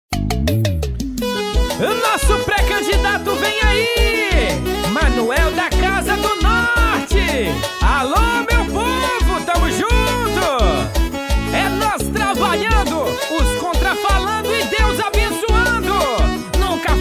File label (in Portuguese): jingles politicos, jingle campanha politica; pre campanha; vereador prefeito eleicao